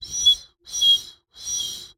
Bird whistle, clay, painted, dry, airy, wispy, triple, medium, descending, chirps; Eiravaein Works; Vaeyan IV